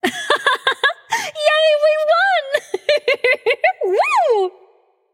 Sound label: yay light; smite; voicepack; hel